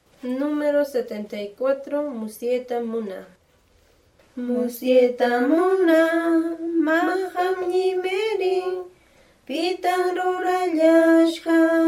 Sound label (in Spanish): cancion